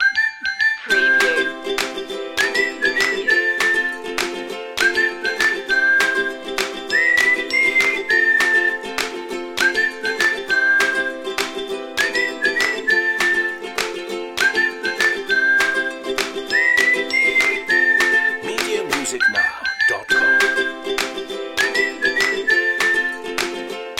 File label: upbeat ukulele loop